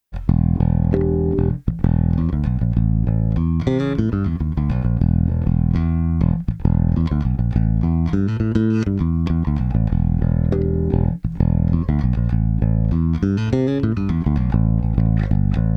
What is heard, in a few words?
Rupert Neve Designs Portico 5017 Bass DI Mic Blend Phase comp fast